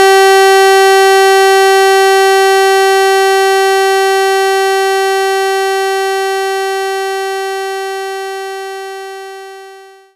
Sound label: Square Click